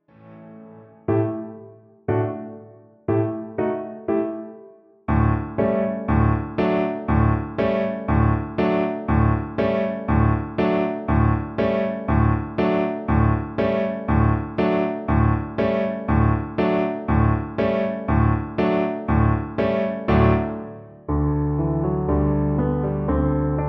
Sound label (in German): baerentanz